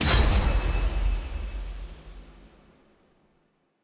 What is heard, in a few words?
summon x10 click; exalted hub